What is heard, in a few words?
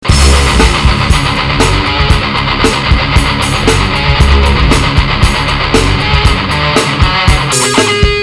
depressing rock music